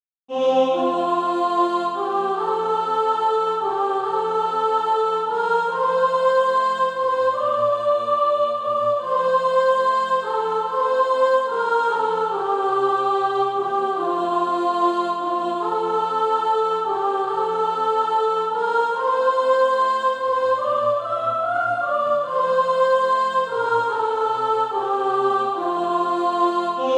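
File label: soprano; From Choirs Redeemed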